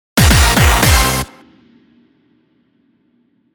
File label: Break in Jingle; tekken tag tournament 2